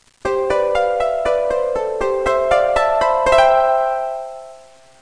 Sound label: harp; music